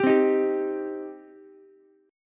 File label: C Minor C down Ukulele; strummed; JGuitar